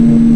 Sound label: loop shield; Mayhem